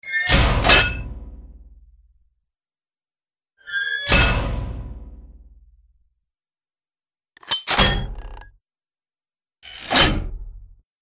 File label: Medium Bolt Mechani, hatches